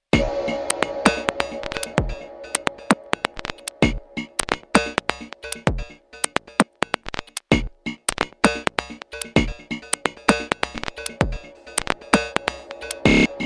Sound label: Melody, RingTune